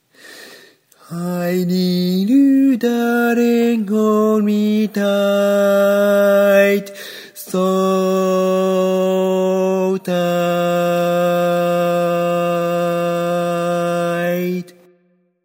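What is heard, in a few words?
Baritone, barbershop tags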